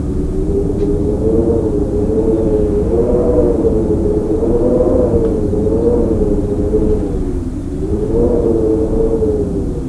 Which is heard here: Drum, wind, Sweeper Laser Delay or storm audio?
wind